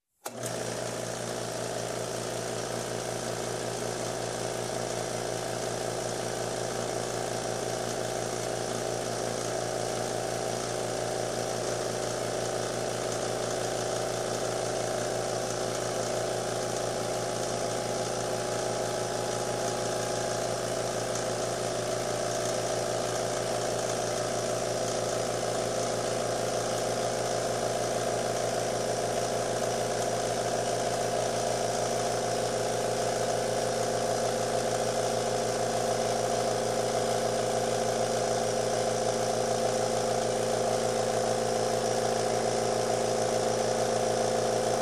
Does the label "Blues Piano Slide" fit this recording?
No